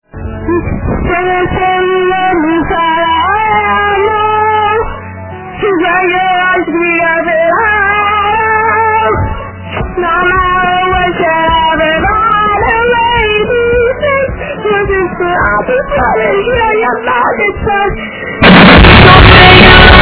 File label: kid singing